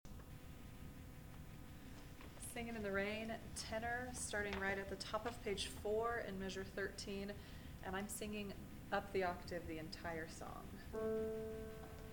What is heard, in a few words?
tenor